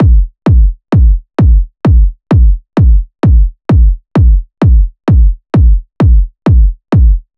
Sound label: Kick Loop 130, Techno